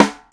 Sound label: Hyper Snare; Airwindows